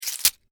Scorpion Tail Snap, Scorpion, Tail, Snap, Stinger, Attack, Arachnid, Insect, Bug; Scorpions; Blastwave; Animals:Insects